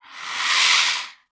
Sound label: zombie